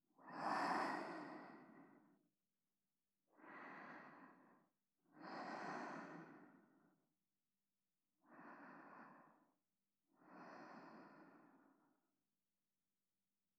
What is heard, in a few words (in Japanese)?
寝息; SEなし